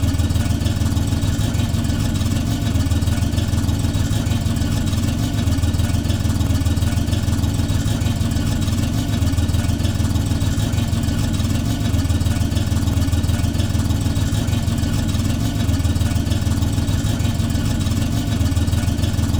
idle loop, limousine; vehicles; sligwolf

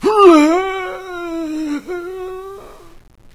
zombie death; doom3

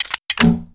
missile unload

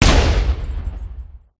hammerturret firestop player; gow

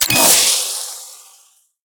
freeze cast

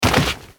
land; basalisk

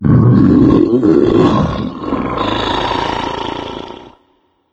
mancubus die long; doom3

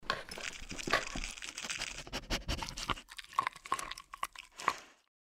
giantrat idle dig; npc

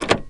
door lock; vcmod

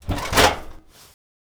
slide, weapons; fatman

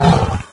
melee, doom3 pinky

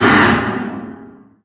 vox atk

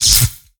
lightning impact

